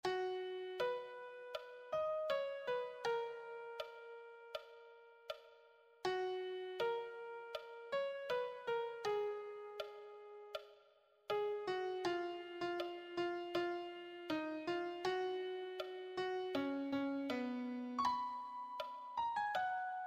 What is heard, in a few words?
melody